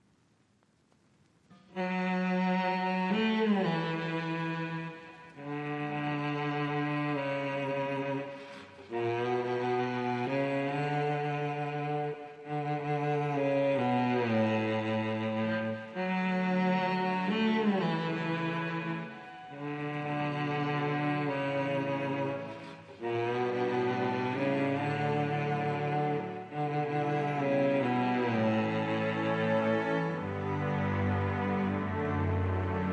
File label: Strings